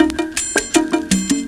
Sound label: Chip 162, breaks